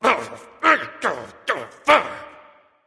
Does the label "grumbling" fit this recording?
Yes